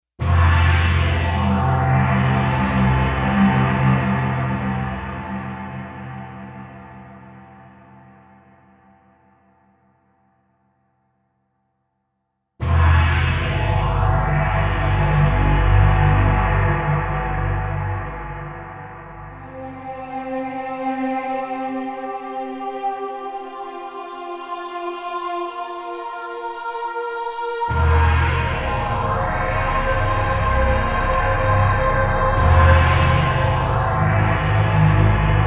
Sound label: TEMPLE GONG, synth